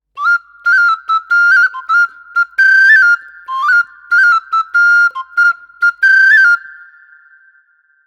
Sopilka

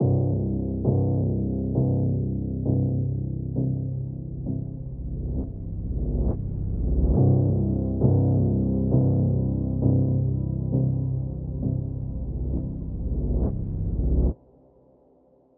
Moog Pulse C 67bpm

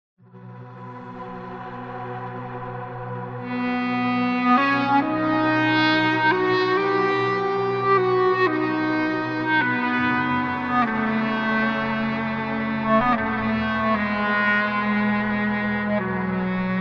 Patch Improvisation

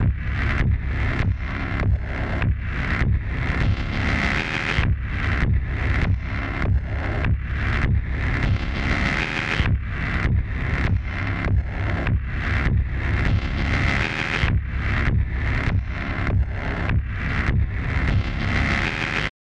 Modular Destination Loop C 199bpm

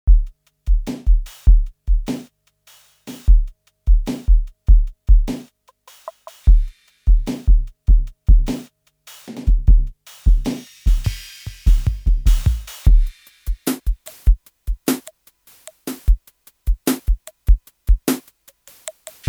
Grit Kit

